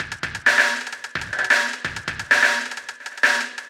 Dubstep 70bpm, MIDI Drum; Ableton; Factory Packs

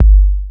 Kick Sub, Made for Drums; Ableton; Convolution Reverb

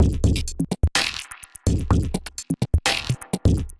Kit groove 126 bpm; MIDI Clips; 122 - 130 bpm; Snake Paw Kit; Glitch and Wash; Ableton

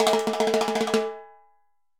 Timbales C H Roll, Latin Percussion, Drum Hits; Ableton; Factory Packs

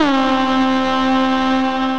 Synth Lead; Kani; Ableton; Glitch and Wash

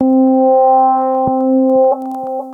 Rise Clav; Piano & Keys; Ableton; Factory Packs; Chop and Swing

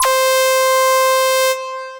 Squeak, synth lead; The Forge by Hecq; Ableton; Factory Packs

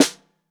Snare Bottom; Ableton Foundation FreePacks; Snare Designer

